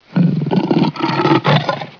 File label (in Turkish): arslan, hayvanlar; efekt